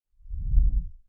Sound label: woosh